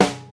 natural snare C sharp key, snare sample tuned to the C# note. Loudest frequency: 1286Hz